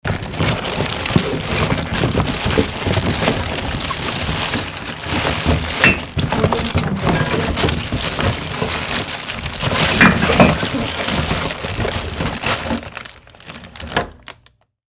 sorting through garbage bag

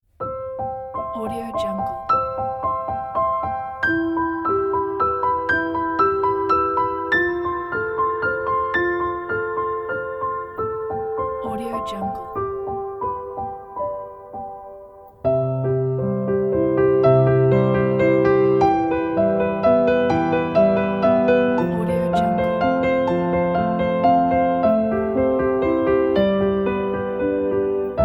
storytelling

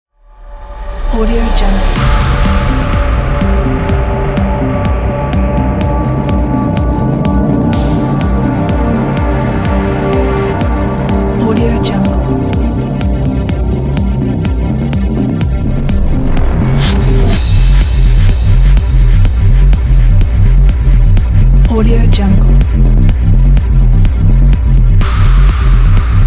ambient tension

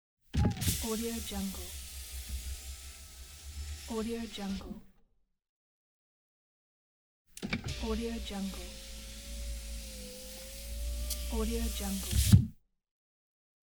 car window

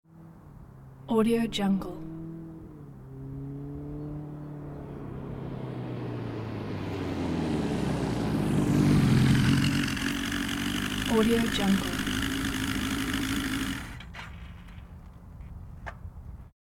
1100cc japanese motorbike rides up stops

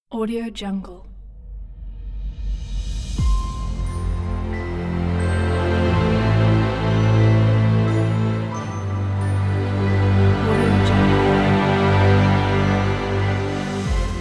dramatic trailer

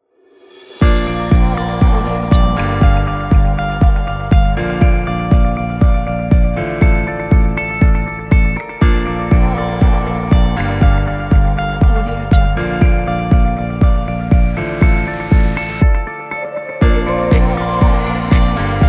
atmospheric corporate

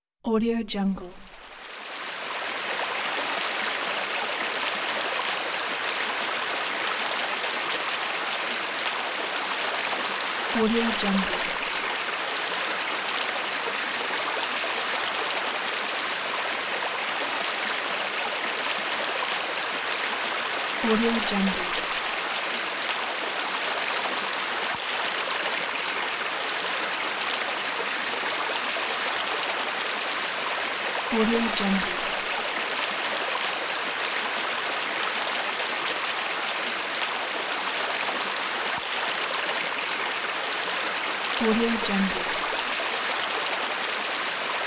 brook

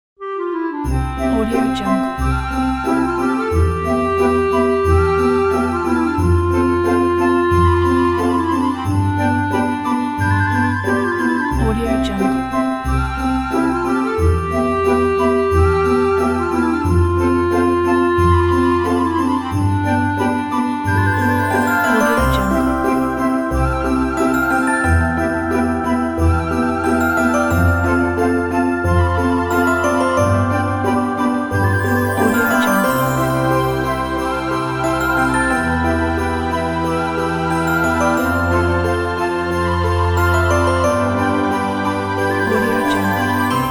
sweet christmas

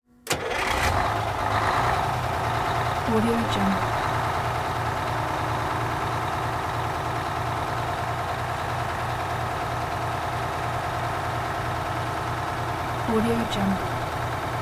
semi truck detroit diesel engine start

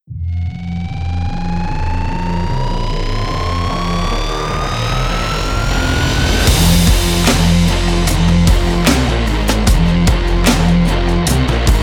rock for sport action; beatroofstudio

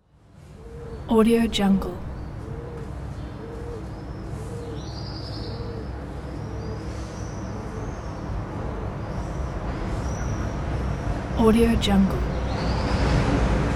city morning ambience with traffic and birds; chicasound

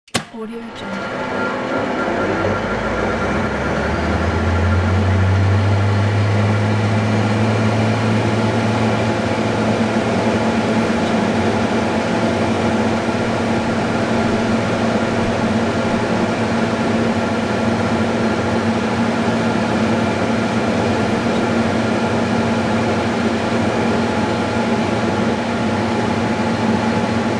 electric motor start

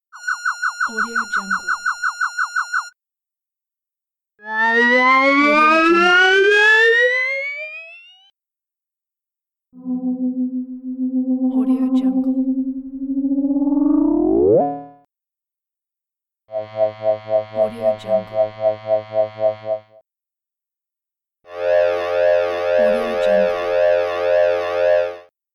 oscillations

